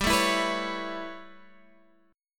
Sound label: A 9th G, Guitar Standard; 15,12,14,16,14,x